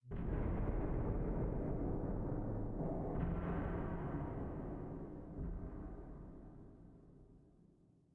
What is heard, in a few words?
sting metal groan; Rust